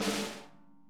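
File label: snare buzz; Sonic Reality; Interactive Drum Kits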